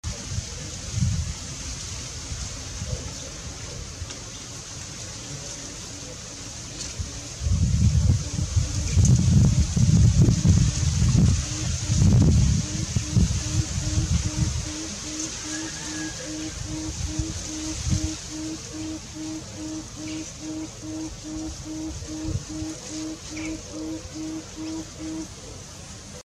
Papuan frogmouth

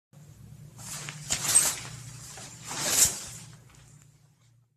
shower curtain; پرده حمام

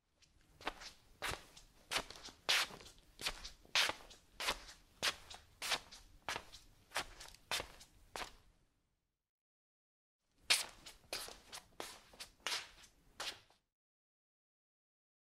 slippers; راه رفتن با دمپایی

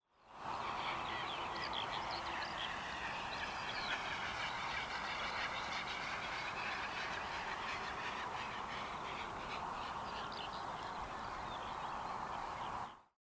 Great bustard

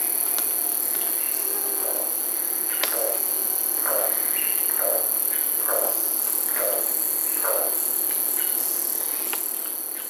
Golden collared toucanet

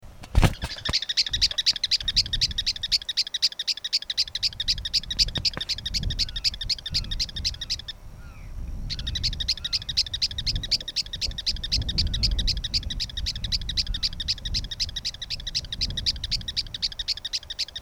Campo Miner

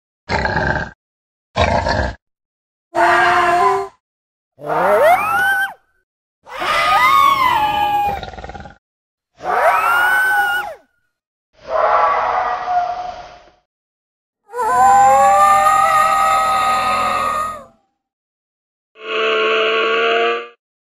Thylacine

